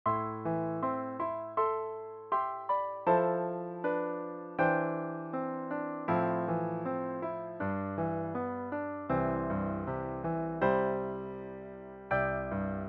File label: Our Father (without voice parts)